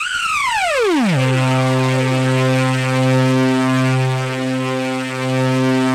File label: SPACE STRINGS, Yamaha VP-1; Music Store